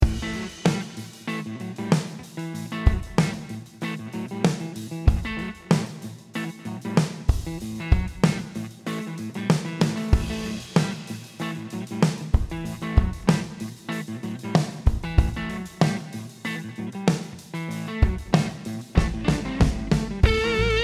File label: FUZZ BLUE BC108C Jammin Fuzzin; CHASE TONE; FUZZ FELLA; Matt Cummings; Overdrive